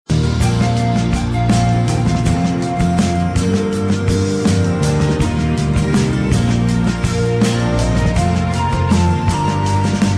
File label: flute; inuyasha